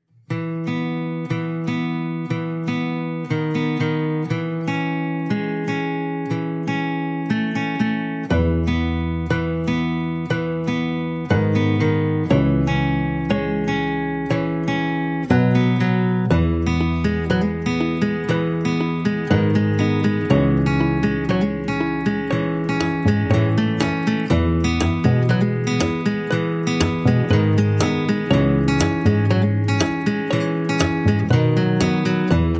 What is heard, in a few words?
No Drums Reduced Arrangement